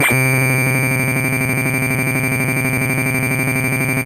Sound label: Catscratch C3, Synth Hits; Zero-G; Soundclash Nu Dance Electro; Acidized